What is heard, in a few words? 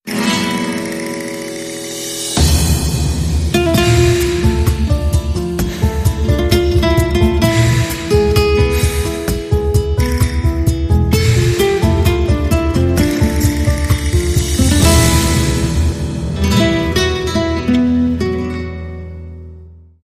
Spanish Guitar